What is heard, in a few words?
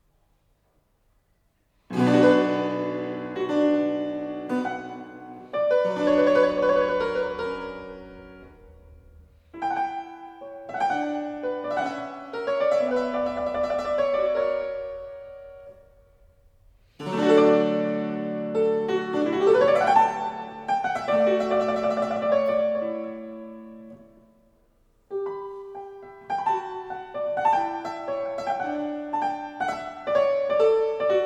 Keyboard Sonatas